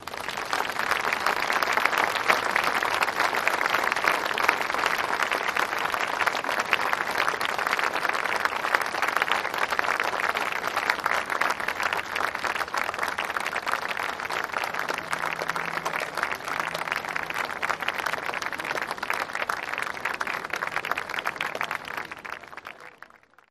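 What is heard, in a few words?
Applause Small Crowd Outdoor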